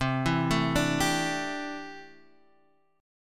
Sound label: C 6th Add 9th C down Guitar Standard; arpeggiated; JGuitar